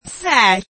gas; reverse

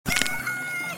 ahhhh

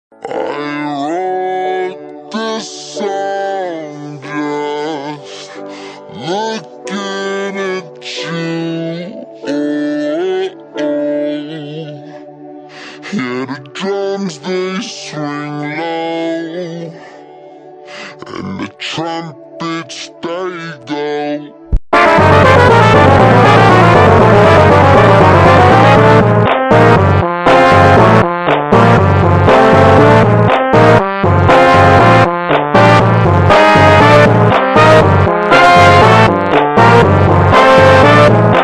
trumpet

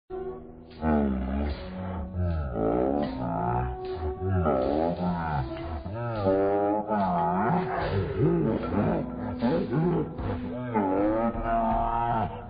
unwinding; vapesquared

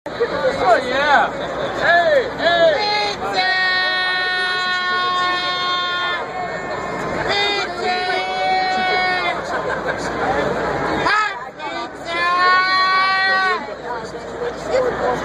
pizz

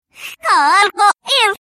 clock, reverse

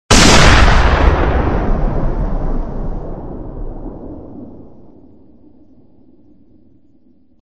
gun

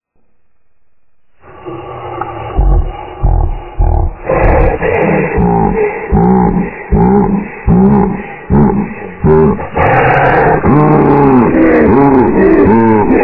laugh

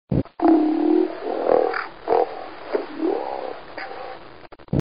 bomb plant; vapesquared

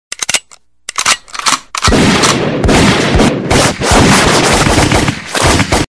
gun

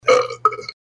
burp

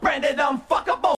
alert; 4chan

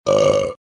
burp

